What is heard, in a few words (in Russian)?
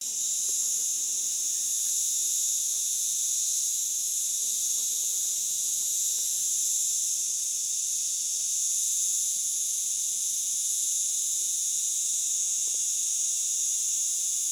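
окружающий звук летним гудением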